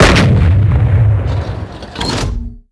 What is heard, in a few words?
Heavy Tank Cannon; blue archive